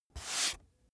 book dust off staged single

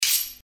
rssshh

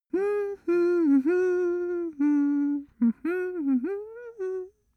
cartoon male hum sequence

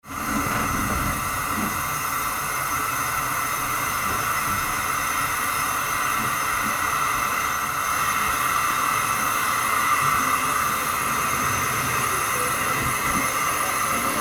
old train waiting and steaming

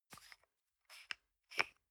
toothpaste screw cup open soft

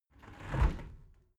window close tilt

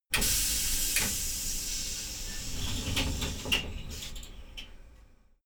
train intercity train hydraulic slide door close

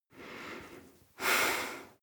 breath female breath heavy

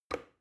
plastic button start push regular

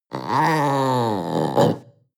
panther young snarl

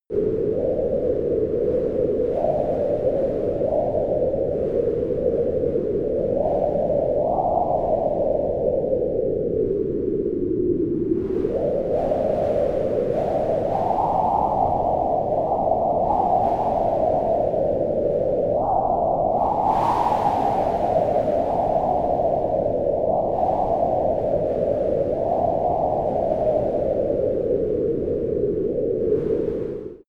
wind howl exterior

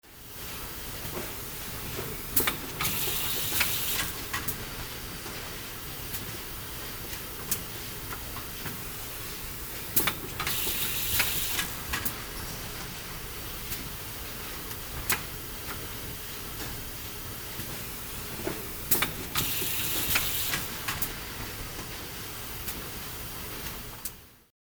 carton packing machine

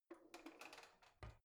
old drawer creaky soft half in

